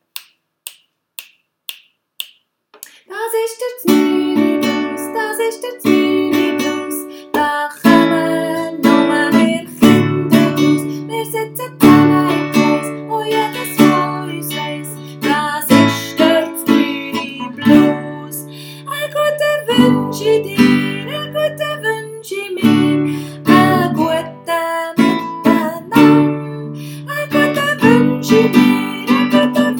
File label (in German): znüni blues